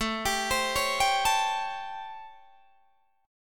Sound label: A 7th Sharp 9th, Guitar, Open D